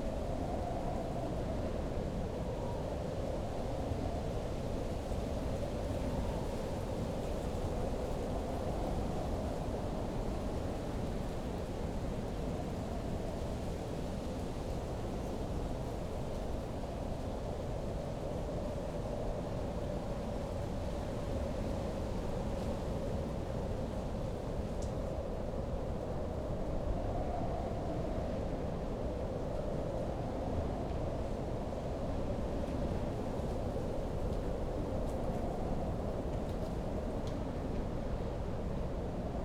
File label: windy night, ambience